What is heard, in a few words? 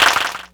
Clapbox Pitch14; Ableton Foundation FreePacks